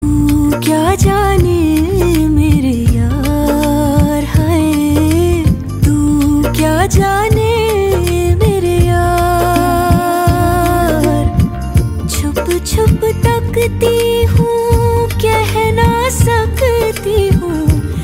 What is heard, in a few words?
Hindi Ringtone